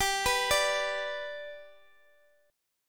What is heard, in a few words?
G Major down Guitar Open D